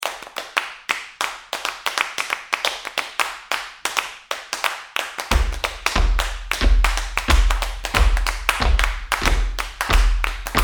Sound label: Claps Stomps; Jane the Virgin